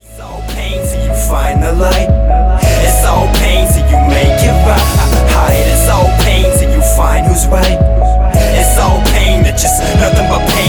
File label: Rap